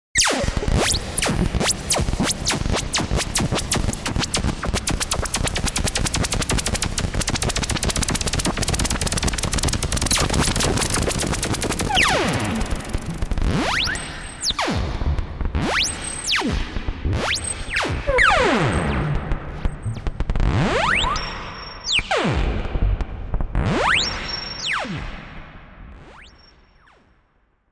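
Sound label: electronic blurps; Universal Sound Bank; Soundscan 14 Twisted Special FX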